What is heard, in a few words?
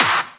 Claps; Vengeance - Essential Clubsounds Vol.3